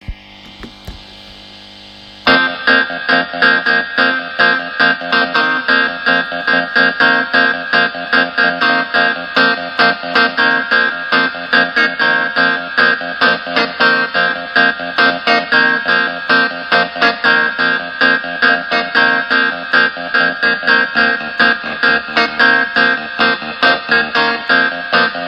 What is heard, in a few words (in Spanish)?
Grabación de un clavinet